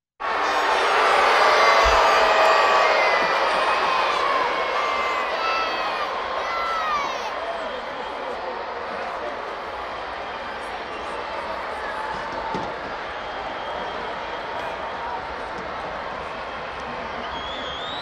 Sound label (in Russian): reakcija tolpy i obshhij shum